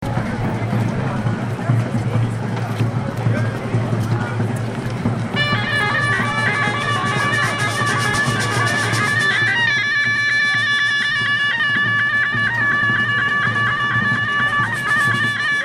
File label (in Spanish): percusión y cítaras Plaza Jeema el Fna